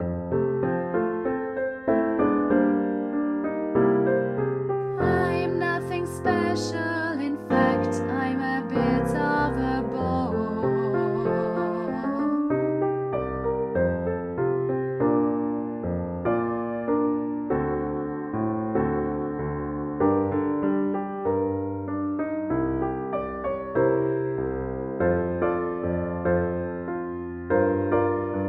tenor, choir

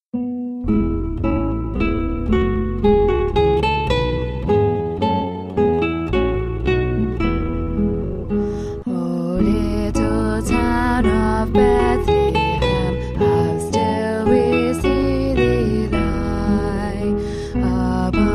tenor

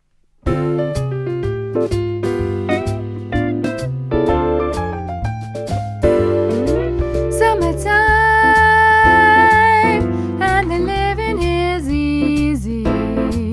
tenor

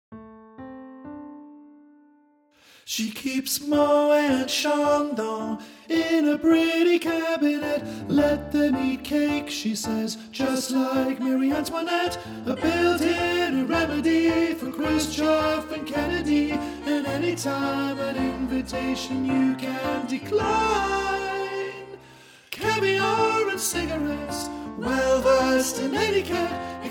ALTO

